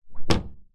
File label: household door open loft hatch jump